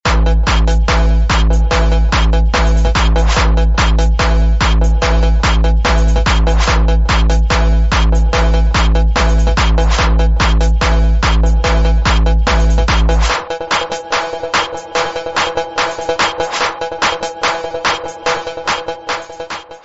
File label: Jumpstyle, ringtone